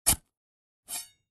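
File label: shovel sounds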